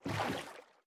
船在水中划桨声

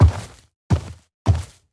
脚步声; 嗅探兽

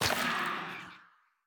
幽匿尖啸体破坏声

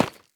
凝灰岩砖放置声

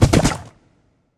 骷髅马水中疾驰声

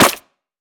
沾泥的红树根踩踏声

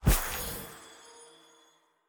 试炼刷怪笼开始生成物品声

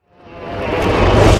音爆蓄力声; 监守者

